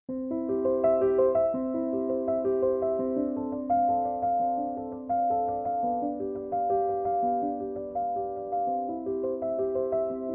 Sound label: Piano Praeludium